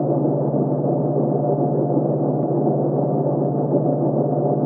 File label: underground belt; factorio 2.0.55